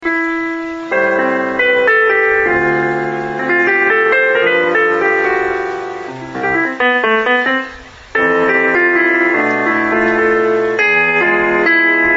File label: solo cyprus, piano; gamos